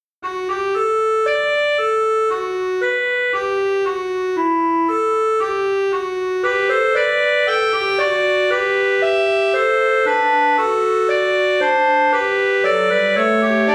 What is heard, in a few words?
Fugue